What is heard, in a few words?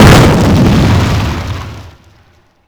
small explosion; WAR